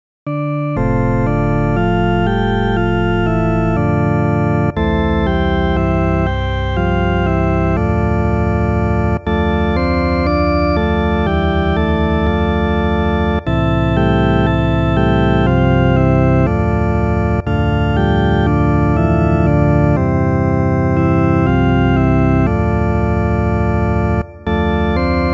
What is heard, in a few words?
solo organ; Victimae Pasch